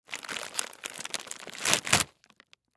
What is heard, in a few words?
Pretzel Bag Open, Foley Pretzel Bag Open Food; Food Sound Effects; Household